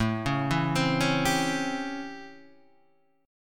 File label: A 7th Sharp 9th; A7#9 Chord {5 4 2 5 2 3} arpeggiated